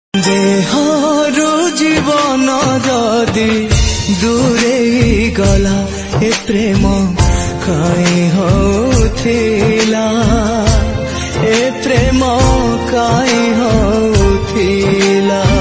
Song Odia

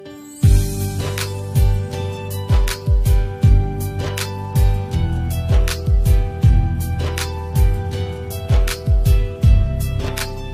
Ringtone Instrumental

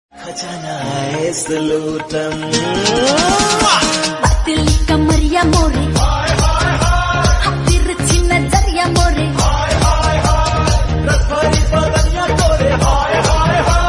Bhojpuri Song Ringtone

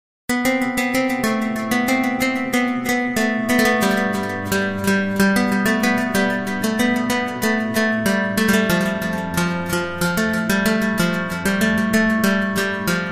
Instrumental Islamic Ringtone

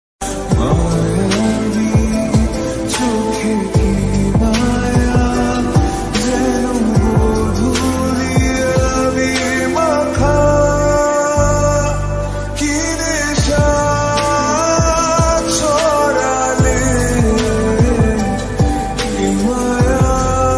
Bengali Ringtone

